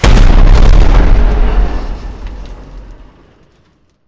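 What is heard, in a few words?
explosion mid distant kaboom; corporate; Titanfall 2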